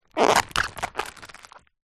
small plastic bag filled with water handling squeaks; foley; zapsplat